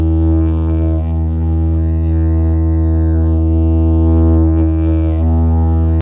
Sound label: fibreglass slide